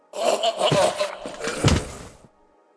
death blader dead, monster

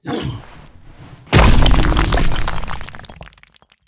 bug shark death, monster

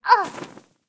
dark assassin damage, monster

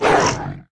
bugzard damage

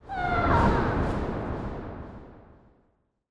succubus death, monster

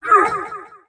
shade damage, monster

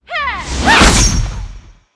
dark assassin attack, monster

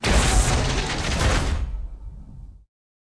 dead, monster

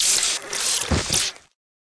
mantis dead, monster

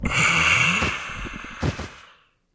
maid zombie dead, monster

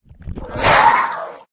ghoul attack

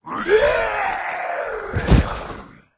gnoll dead, monster

